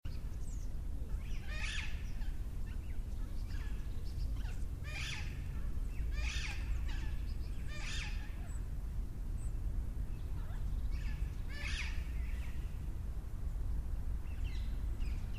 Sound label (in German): schlosspark stammheim mit halsbandsittichen und klangobjekten